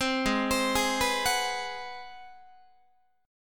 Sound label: G sharp 7th Sharp 9th, Guitar; arpeggiated; 15,16,15,18,15,17; 4A,4E,5A,5D,5G#,6C#; G#7#9